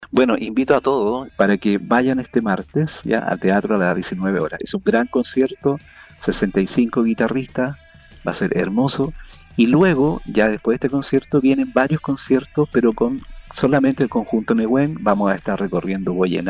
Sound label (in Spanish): GUITARRAS EN NAVIDAD; tradicional concierto guitarras de navidad en el teatro serrano